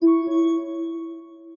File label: Jingle